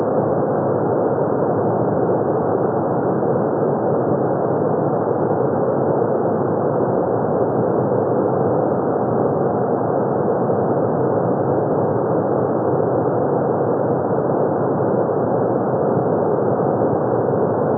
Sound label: Mesopelagic animals; scripps